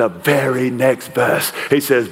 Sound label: Vocal Phrases; Vengeance Samples; Vocals